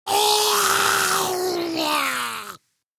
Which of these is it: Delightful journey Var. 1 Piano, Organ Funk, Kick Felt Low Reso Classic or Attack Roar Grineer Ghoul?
Attack Roar Grineer Ghoul